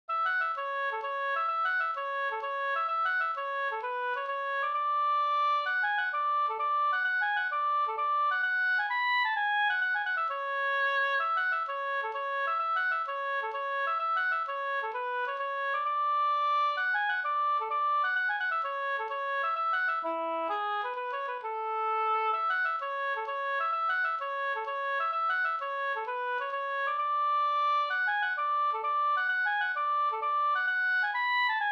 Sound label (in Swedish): mazurka